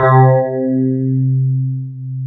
DS8 C 3, Korg

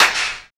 SD, Clap; Sound & Vision - Gigapack I; Snap

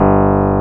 Rhodes; Greytsounds; CLASS RHODES

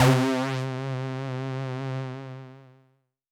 Synth C, Synth Prophet One Shots; Electronisounds

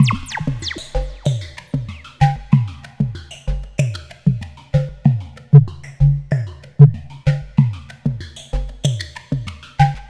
Percussive

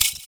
FOOT PERC; Univers Sons - Raricussions

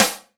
SD HIP; Best Service; Dance Mega Drums

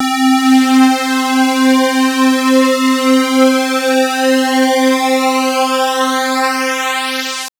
Synth PulseSweepPad c3; TrashLibrary

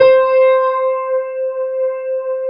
PNO FL C5 L; USB Soundscan vol.51; House Side Of 2 Step; AKAI; PIANOS

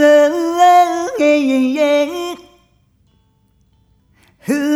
Rhythmic D

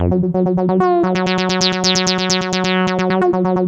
F#, synth line

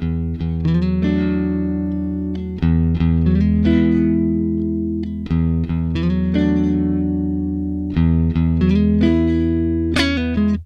Guitar Licks

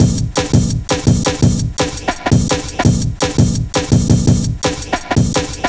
Old School, Jungle, Drum Loops 168.5; Zero-G Jungle Warfare 1, 2 & 3

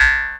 C# JAW, Primitive Instruments; Big Fish Audio - Didgeridoo and Other Primitive Instruments; JAW SIMPLE

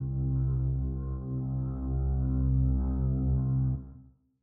Synth C1, 120bpm, House; Big Fish Audio; Old Skool House; Construction Kits; House of Amnesia

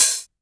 hi hat open, Korg Electribe ER-1; Kit 22

